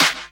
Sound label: CLAP HOU, Dance Mega Drums; Best Service